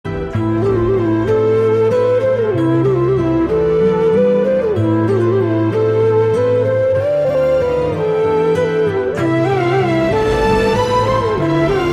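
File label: background music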